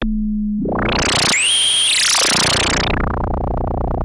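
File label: Sample and Hold Filter, SFX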